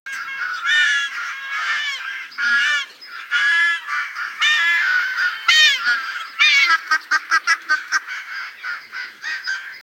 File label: the ducks and swans sprea